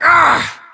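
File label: voice hurt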